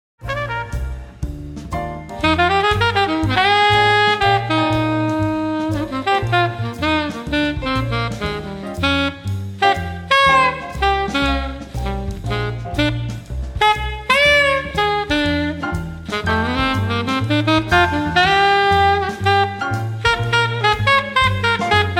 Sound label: alto sax solo